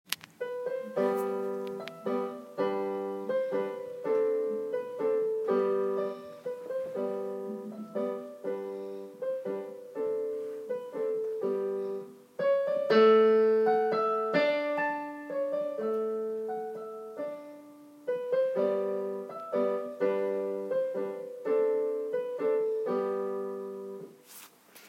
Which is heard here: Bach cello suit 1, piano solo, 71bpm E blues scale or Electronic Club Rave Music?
piano solo